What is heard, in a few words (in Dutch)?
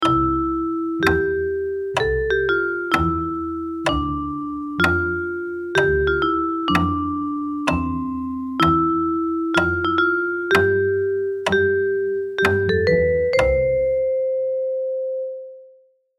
wachtmuziekje